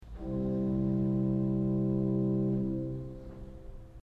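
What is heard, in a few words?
gemshorn 8 C, organ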